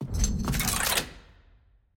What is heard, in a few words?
Lock Enter; Puzzle